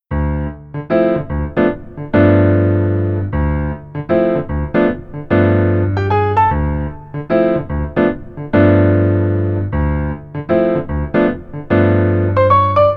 keyboard

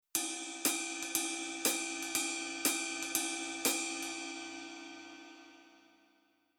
Rhythm Basic Ride

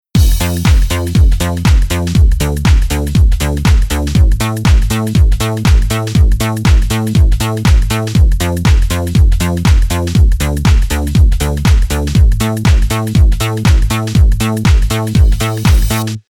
Synth Bass

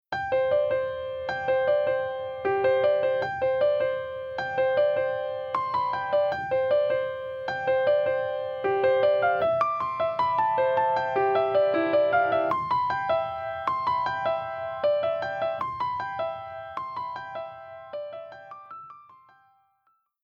Basic Piano Comp On

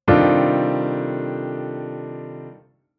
Chord Poly; Jissen

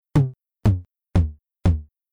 House Tom, Rhythm

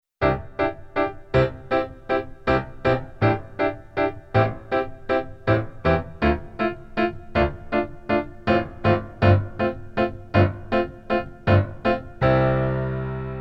Keyboard Pf Pops Pf

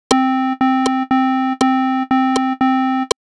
Rhythm Note

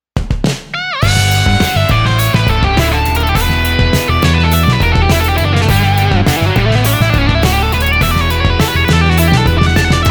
guitar

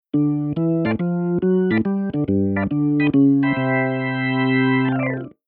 Keyboard Organ Drawbar

